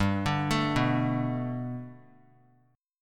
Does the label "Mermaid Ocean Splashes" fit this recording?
No